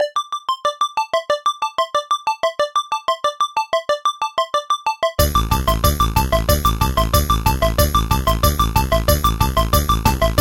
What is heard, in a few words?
Motorcycle Chase